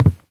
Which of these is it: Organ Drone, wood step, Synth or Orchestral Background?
wood step